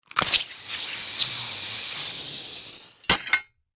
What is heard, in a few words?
Warfare Grapple Gun Shoot Impact; Miscellaneous Combat; SFX Bible